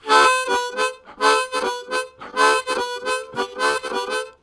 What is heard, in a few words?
Interupted Vamp, Harmonica, Riffs; Zero G